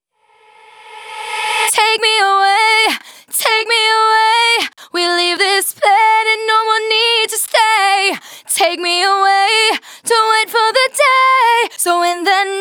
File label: Female Vocals Chorus DRY, 140 BPM; Vengeance Samples; VTDS2 Song Kits; Female Take Me Away